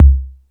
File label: Beat Box, E-MU Pro-Cussion; drum machine